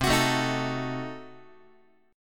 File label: C Diminished 7th down Guitar; strummed; JGuitar